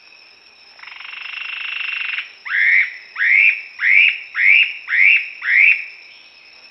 Cichlocolaptes mazarbarnetti song type 1 spontaneous at dawn